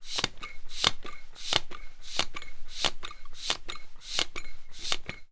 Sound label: household foot pump; sound effects one